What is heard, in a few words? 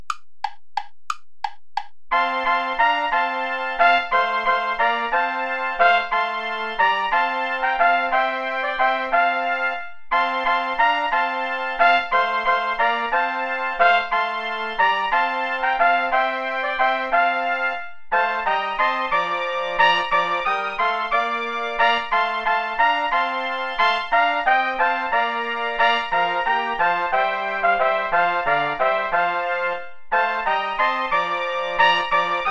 play along without trombone; gastoldi giovanni giacomo; thee gladness